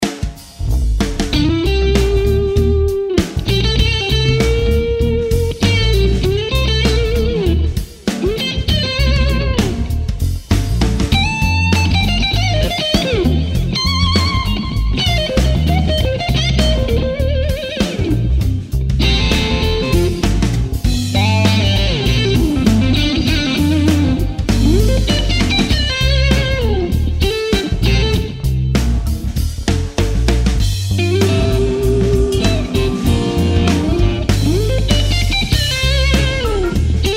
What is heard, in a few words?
BLUES